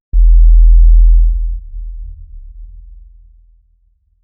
persistent subtle low und; danger

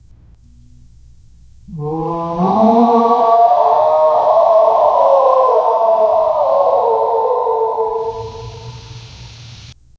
distant tyrannosaurus rex; film

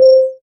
a rewarding zen like soun

